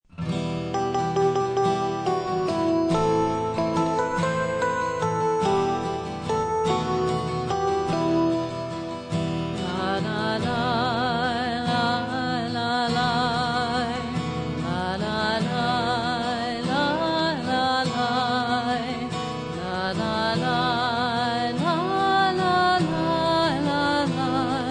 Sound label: Niggun